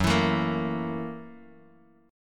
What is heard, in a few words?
Gb 7th Sharp 9th Gb Bass Standard; strummed; Gb7#9; 14,19,19,15; JGuitar